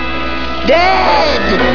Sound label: death; alienarena; johnny5